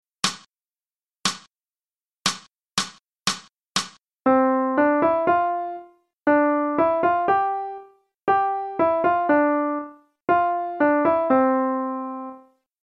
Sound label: Transcribing Rhythms; non-swinging